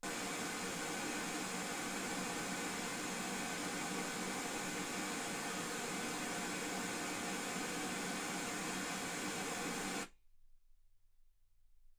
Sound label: wgn 48kHz